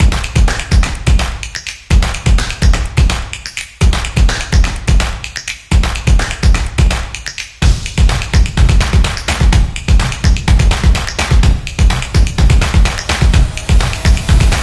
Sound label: Festival Music